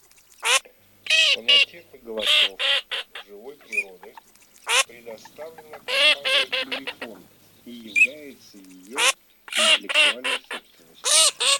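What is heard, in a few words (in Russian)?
Чирок-свистунок Alzavola, манок; plurifon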